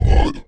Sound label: INDIA CANNON hit